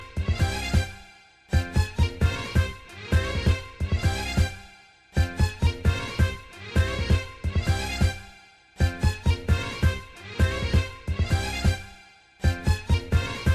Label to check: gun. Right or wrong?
Wrong